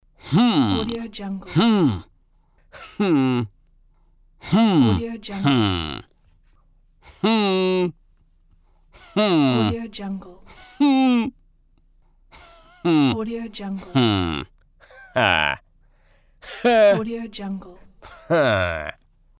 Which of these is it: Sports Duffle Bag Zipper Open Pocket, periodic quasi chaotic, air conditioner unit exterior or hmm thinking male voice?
hmm thinking male voice